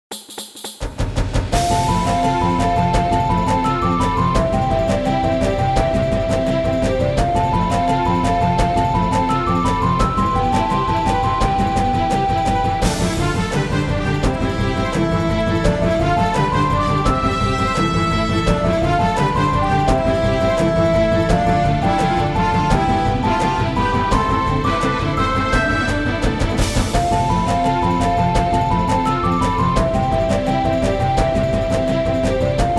Orchestral Fast; Zelda; Saria's Song

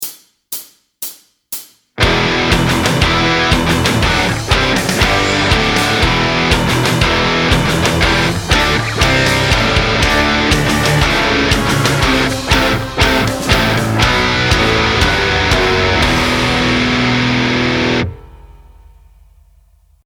power chord variations

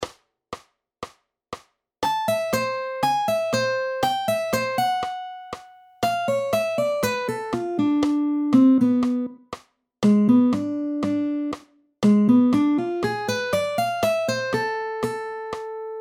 Easy Gypsy Jazz Guitar Licks for

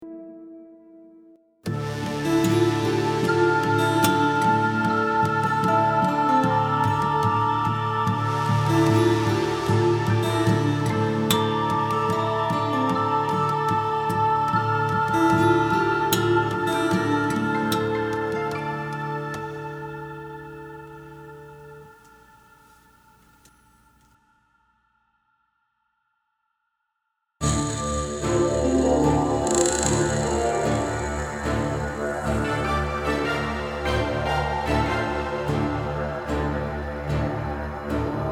Musical Cues

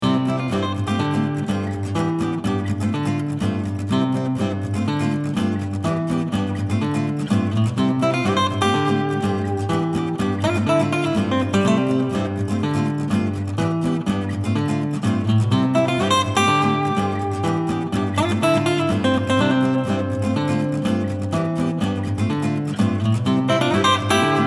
Acoustic

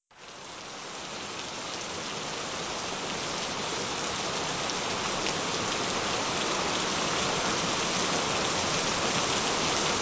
Gentle Rain Sounds

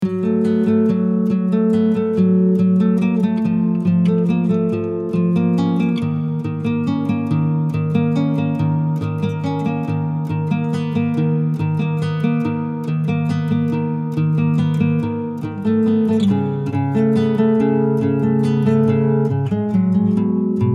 Midnight Wood Acoustic

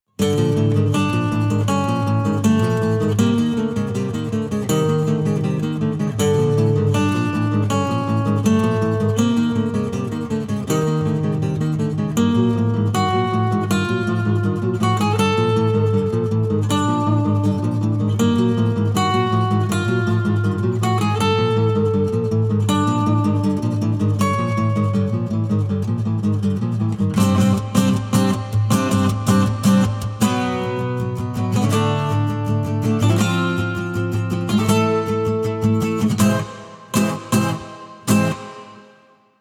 Metal Attack Acoustic Short

